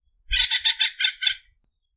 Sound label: melanerpes formicivorus, Aves; Paramos; vueltas; historia natural; Galeria biodiversidad